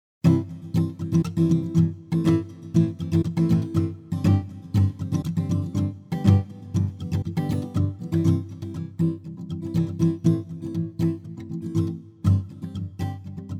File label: Guitars Strummings; PoeticGuitars 2